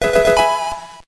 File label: Level Up; GEN8- LevelUp RSE; infinitefusion